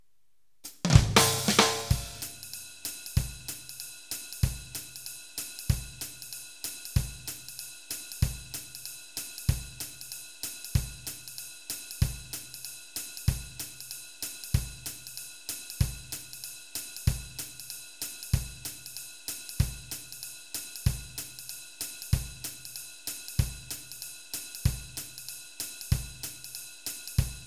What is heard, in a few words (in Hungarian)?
jazz kiseret alap, gitár